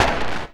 Noise Hit; Drums & Drones

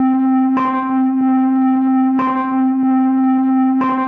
Crude Tape Loop, Tape delay; radiosonics

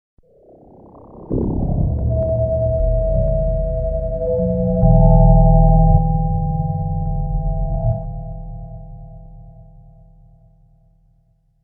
Vocal Transformation; Bizarre Planet Sampling CD

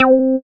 synth, Euro Style, Dance Synths; Best Service ProSamples vol.37 - Dance Synths

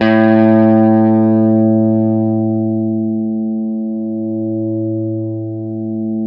STRATOCASTR

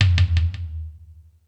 Space Drums, E-MU Pro-Cussion; drum machine

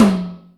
Electric Tom, Yamaha DTXpress

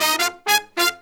Pop & Funk Brass, 096

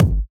Kick; Zero-G; Nu Disco

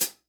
FOOT, 30S JAZZ; Northstar - New Gold VOL-1

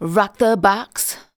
vox, Techno Trance; Ueberschall

